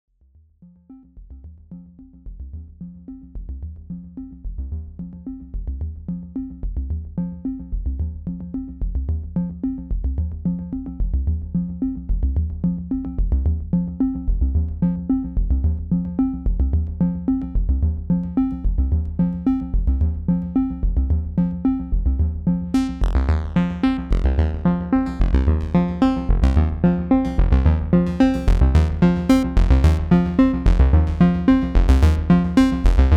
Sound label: DOEPFER DARK ENERGY Mk1 FM